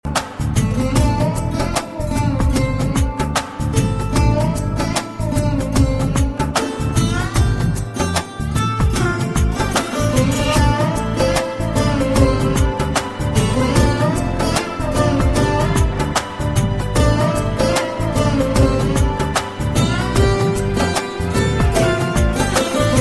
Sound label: Guitar Vs Bass